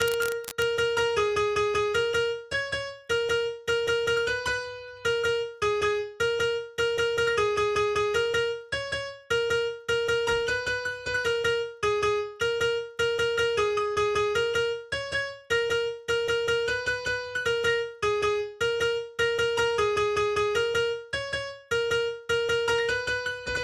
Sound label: Drop Synth DRY NO SC